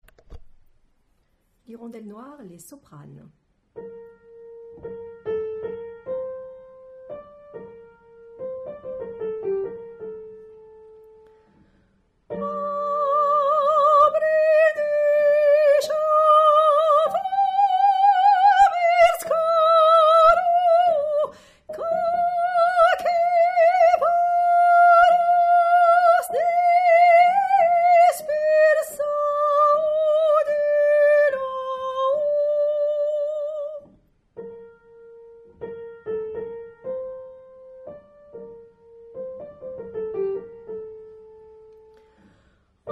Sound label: Soprano